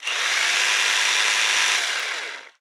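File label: Weapon Ripper idle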